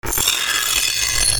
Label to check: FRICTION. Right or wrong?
Right